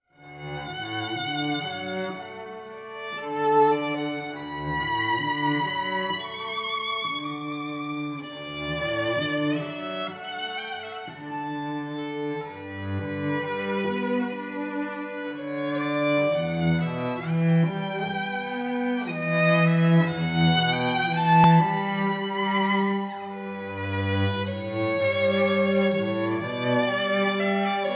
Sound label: Meditation duo